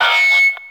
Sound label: Mall Hub Stage Feedback; Ambience; Warframe; Nineteen Ninety Nine